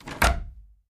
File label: Attic Closet Door Clo